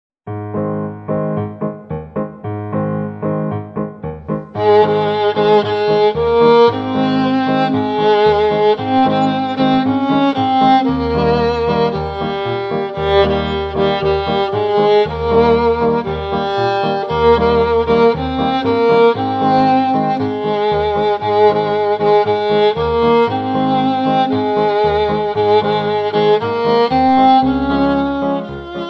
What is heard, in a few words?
bossa nova